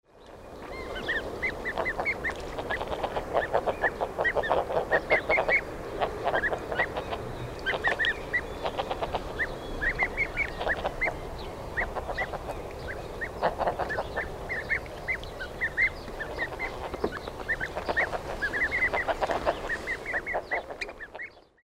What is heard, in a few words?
eider